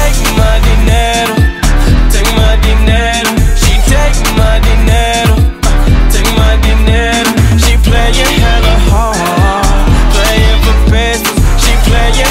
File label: alien music